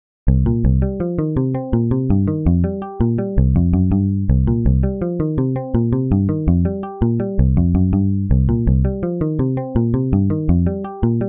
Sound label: blues jam